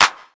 juicy steel snare drum sample D key, acoustic snare sound tuned to the D note; snare drum samples